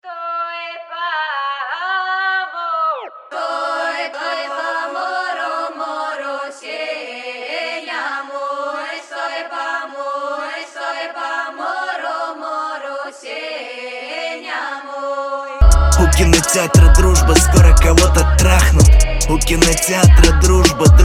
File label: song